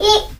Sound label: tick